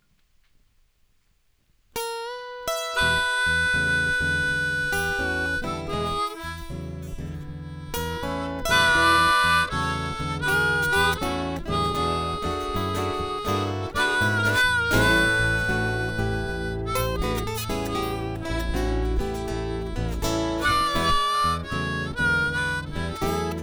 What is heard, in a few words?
instrumental